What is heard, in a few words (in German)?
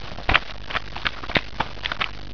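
BLAETTERN; 999wavs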